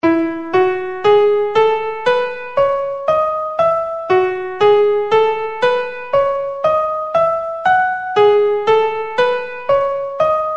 mode mi gamme do

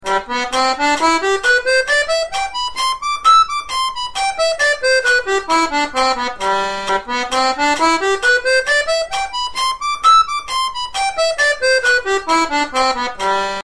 gamme synthetique sol pousse vite

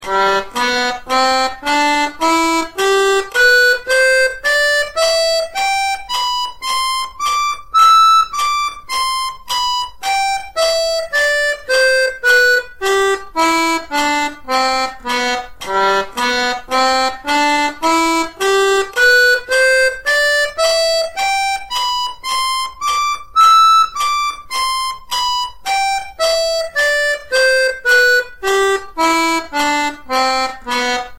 gamme synthetique sol pousse

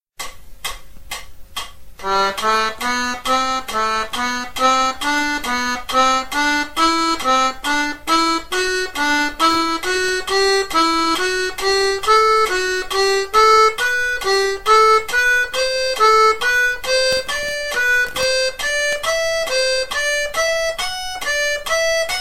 gamme sol pousse tire par 3

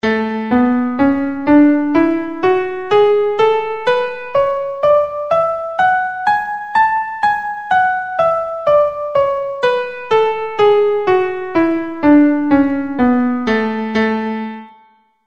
gamme la

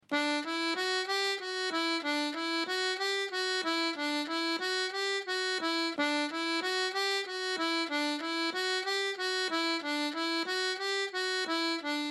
re mi fa sol

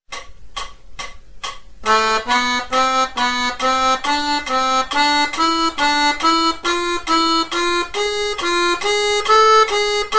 gamme la m harmonique par 3

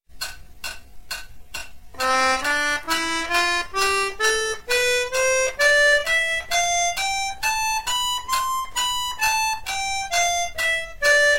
gamme do pousse tire

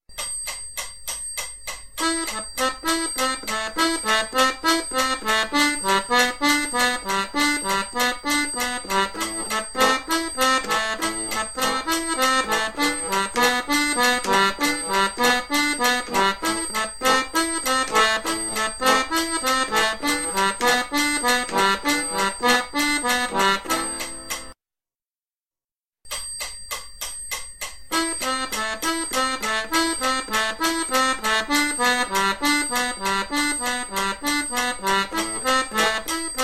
arpeges; sur une suite d'accords; Montez les accords de "Do Rém Mim Fa Sol Lam Sim Do"; puis redescendre ... en arpégeant

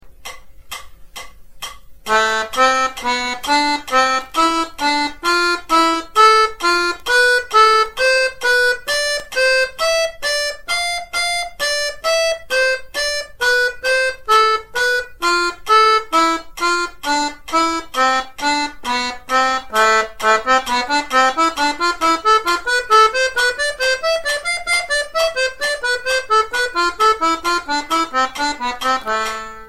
gamme synthetique la tire par tierce

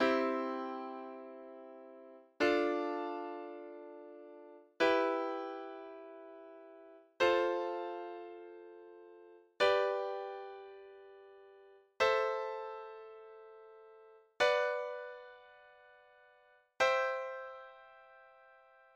gamme en accords

